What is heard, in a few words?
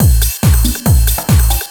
hard beats 140, hard trance beats